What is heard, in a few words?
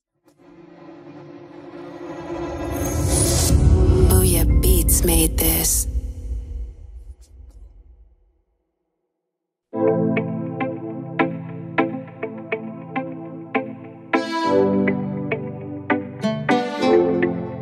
oriental trap beat x balkan hip hop instrumental